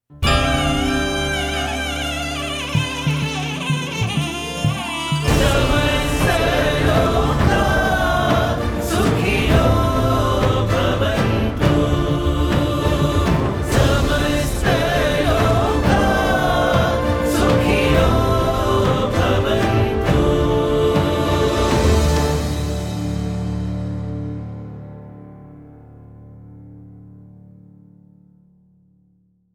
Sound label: Ringtone; 95th Birthday; Prasanthi Nilayam